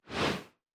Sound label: Menu Notification Out